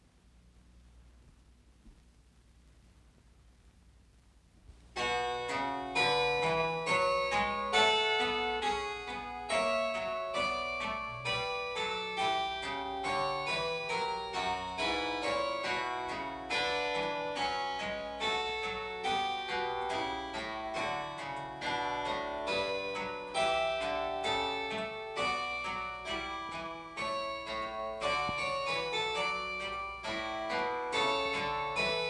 Well Tempered Clavier - Prelude in Fugue No.24 in B minor; JS Bach